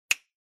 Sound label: Finger Snap Foley, Dry